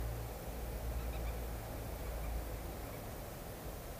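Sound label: Moorhen call, AudioMoth in case; nocmig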